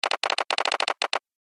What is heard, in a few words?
Telegraph Sounder, beep; Telegram; Beep Sound Effects